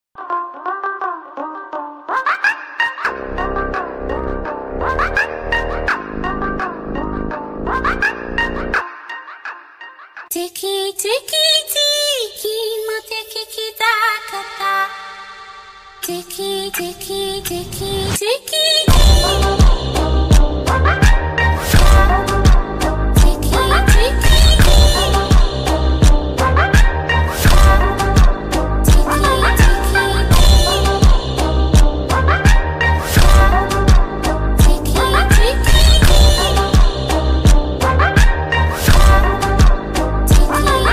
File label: Phonk funk